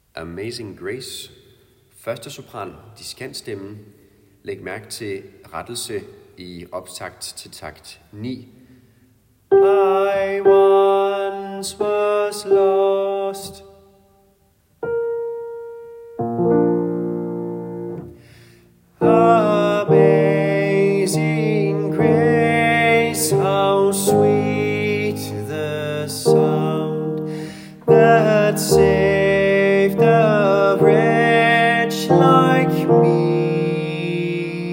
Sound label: Amazing Grace sopran; laudate kirkens folkekor